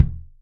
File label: Kicks Soft